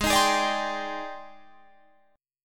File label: G sharp Major Sharp 11th, chord